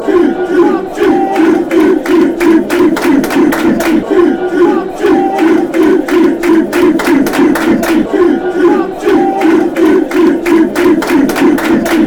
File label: blackburn chant